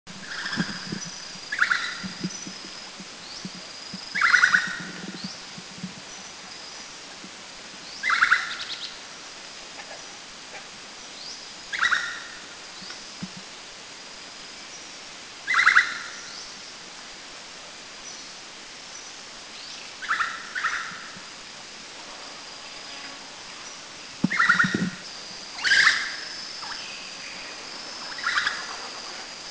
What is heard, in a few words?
Great Slaty Woodpecker, Thailand